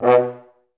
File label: FH STAC B1; Best Service; Peter Siedlaczek's Advanced Orchestra